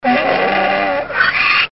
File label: muskox